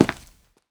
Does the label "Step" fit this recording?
Yes